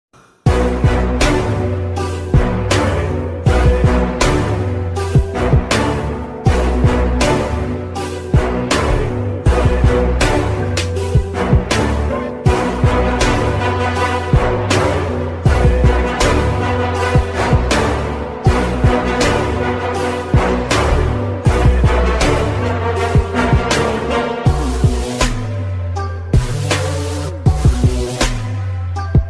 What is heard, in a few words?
Bgm; Thani Oruvan